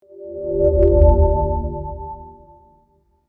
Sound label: ui welcome